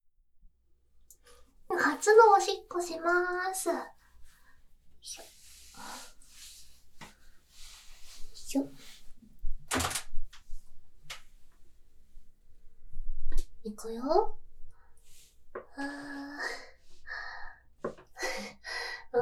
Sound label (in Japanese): おしっこです